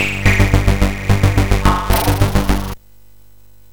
Tube, MOS6581R4

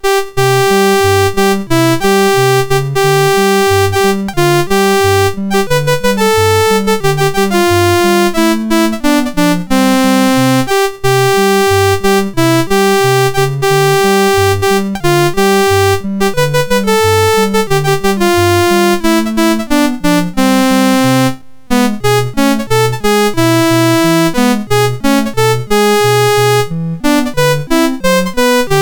MOS6581R2

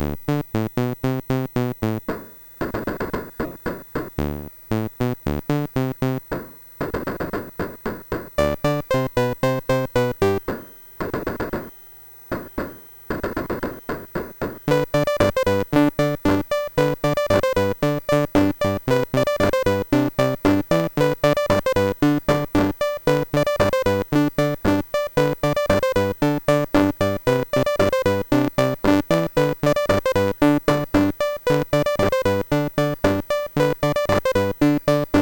Mini Golf, MOS6581R4

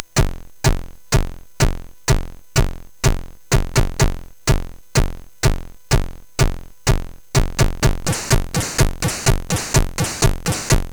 Death Metal sid CSG8580R5; hvsc

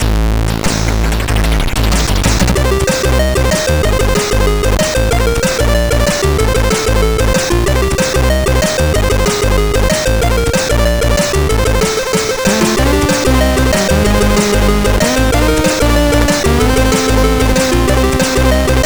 Meaning, CSG8580R5

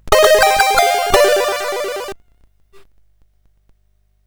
Jingles, sid CSG8580R5

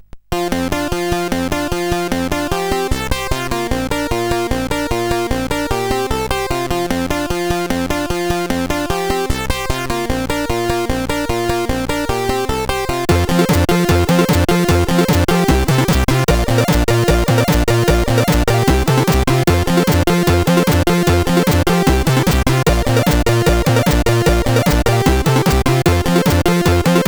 Fire to Jump sid CSG8580R5

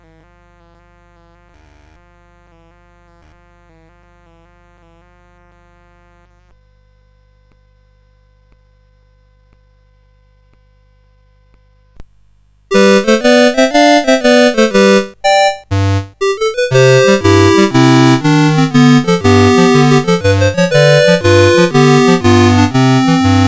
CSG8580

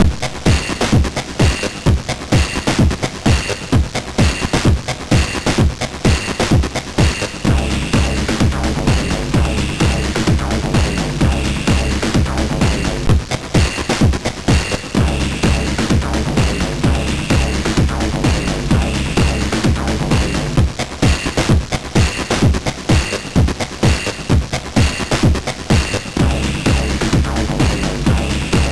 tune sid MOS6581R2; Technotica tune 2

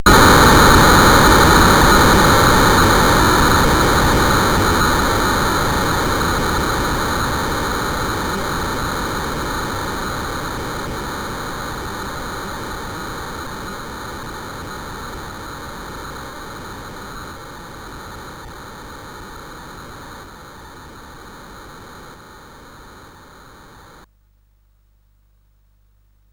Frantic, CSG8580R5

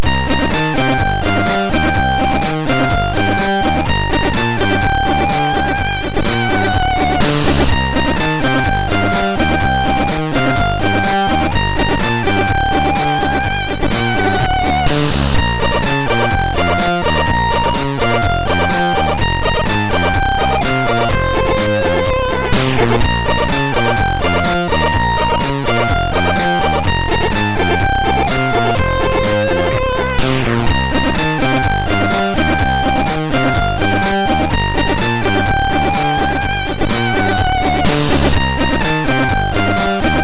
Takt, MOS6581R2

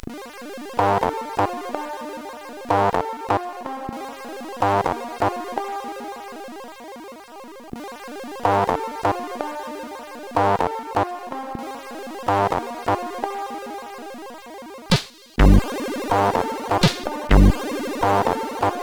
Dream Injection, CSG8580R5

